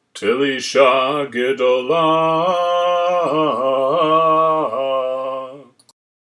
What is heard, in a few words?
Haftarah Telisha Gedola, trope; sinai